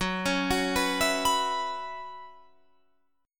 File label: Gb 7th Suspended 4th, Guitar Open F; Gb7sus4 arpeggiated